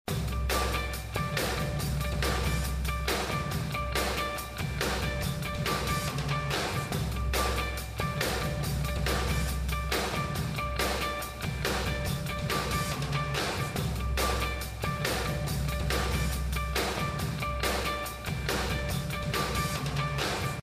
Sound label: unlimited loop